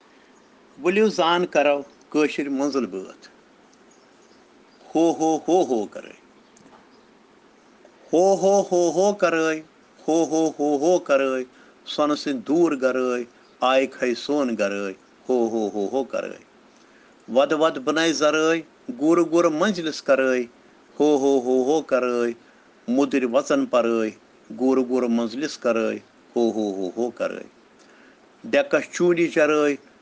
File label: Children Rhyms in Kashmiri